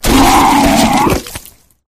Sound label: flesh destroyed large; flood